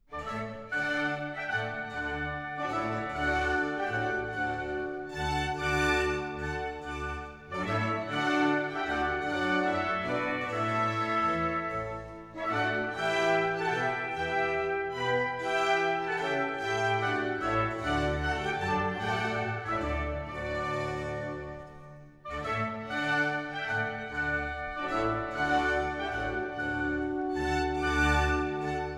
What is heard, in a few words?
Water Music Suite in D Major Lentement; Handel; Fireworks Music & Water Music